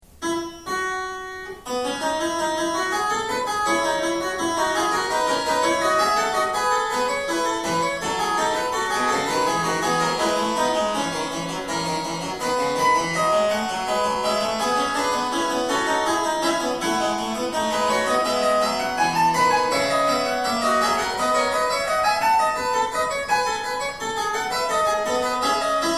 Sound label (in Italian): fuga; bach; bwv854